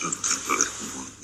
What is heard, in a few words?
gorilla